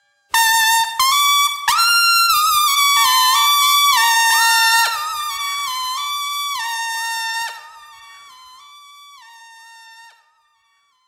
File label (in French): Echos Trompette